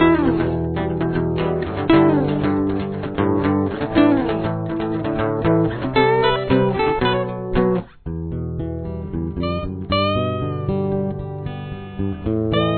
verse 2 full, Guitar 1, Guitar 2 ( lead ), both parts sound like together